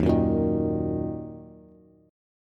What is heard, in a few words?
Eb Minor, Bass; Ebm strummed; 11,9,8,8; JGuitar